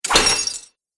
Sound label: Scimitar Slashes Chainmail Armor, sword